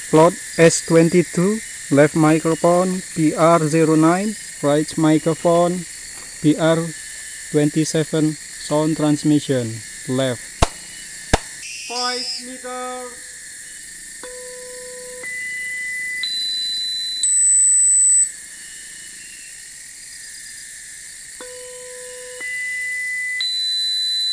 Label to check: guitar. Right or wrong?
Wrong